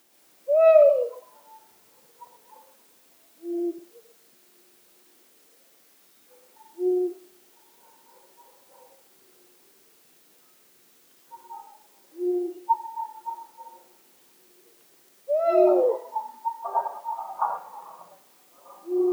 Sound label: duet